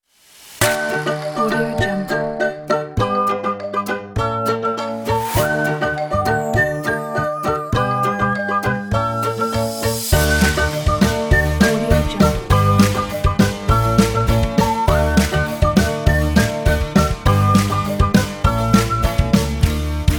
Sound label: cartoon animation funny acoustic